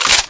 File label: mp7 clip in, weapons